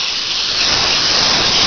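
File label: GAS ESCAPE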